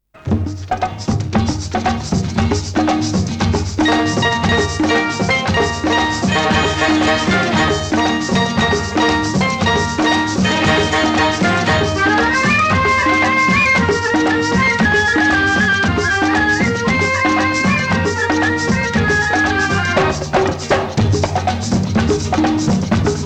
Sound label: Instrumental